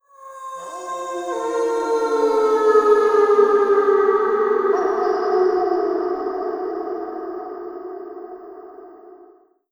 wolves at scary forest; mixkit

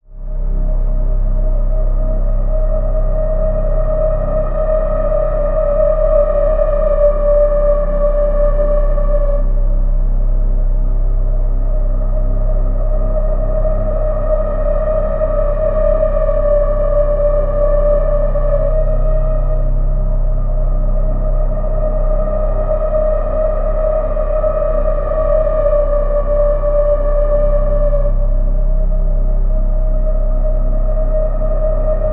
Drones Scary

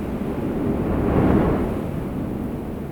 skydive audio

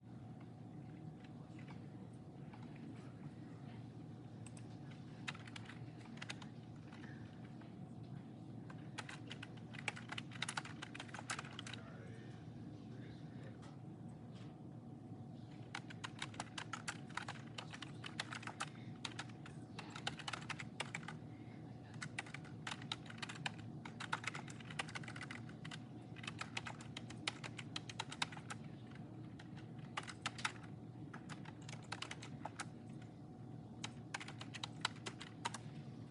audio office; Charles Schwab

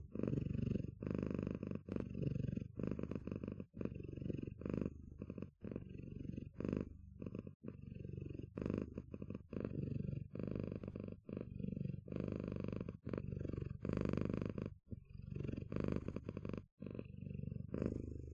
cat purring

